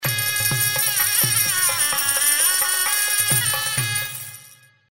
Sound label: BGM Summary, slots; Majestic India